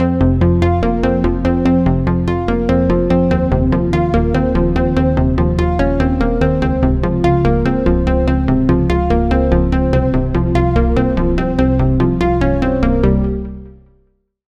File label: Pluck; Routed Sound; Spire Expansion - Reality Maker Vol.1